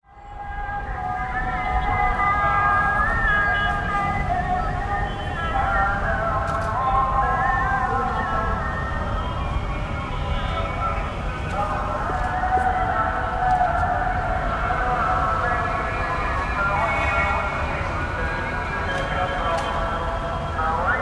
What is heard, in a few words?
ambient call to prayer; syria street